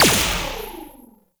Laser Skin Printer Laser Start; Ambience; Props; Warframe; Entrati

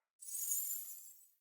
Bow Draw; Powers; Wyld; Fey; Saboteur

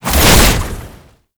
Entangle Cast Whoosh; Warframe; Oraxia

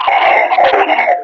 Enemy Death; Warframe; Lasrian; Battle Barks

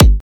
Briefcase Akimbo Pistol Punch; Warframe; Corpus

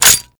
Dual Pistol Mech; Warframe; Grineer; Queen Guard

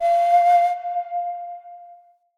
Flute F; Player Instrument

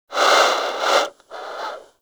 Bird Snore; Hunting Critters; Sawgaw

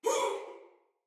Pain Soldier Female; Battle; Barks; Dialog; Sinecure Soldier VIP Female A

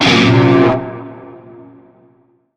Shawzin Blues Chord; Warframe; Narmer Shawzin

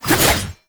Staff Swing Spin Sweetener; Warframe

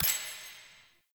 Menu Hover Confirm, UI; Warframe; Awakening Remaster

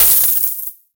Fist Claw Coin Impact; Weapons; Warframe; Tenno; Incarnon

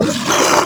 Flying Mouth Pain; Warframe; ManInTheWall

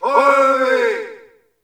Ambient Campsite Yells; Warframe; Tau

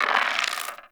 Roll Dice; Cetus Vendors; Five Fates

